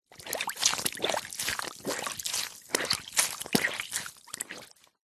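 household cleaning cloth squeeze in shallow water rinse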